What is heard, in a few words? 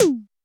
Roland U220 Electric Set, Drum Machines